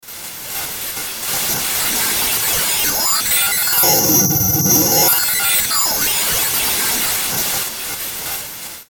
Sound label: static oscillation; Static Oscillation Sweeper Fuzz; Production Element Imaging Element Accent Transition; Blastwave; Static Sound Effects